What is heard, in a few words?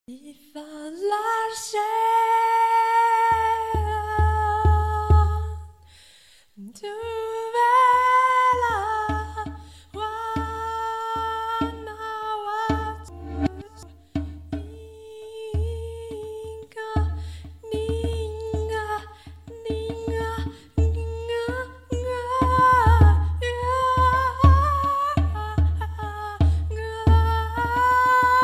reverse